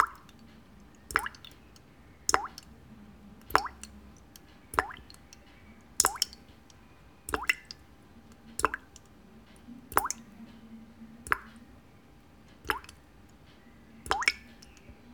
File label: water dripping; best service Blue Box; water fx